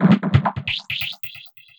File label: philter beat tweezin; Sonic Foundry (Sony Creative Software); Ugly Remnants VOL-2